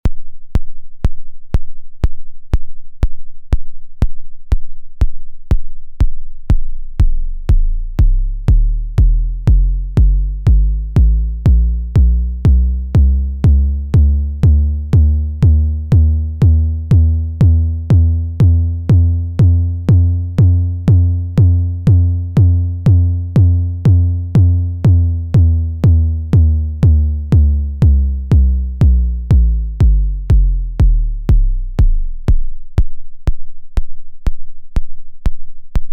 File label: DRM1 MkIII BD Pitch Mod Sweep